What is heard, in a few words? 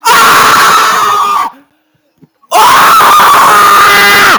scream; gai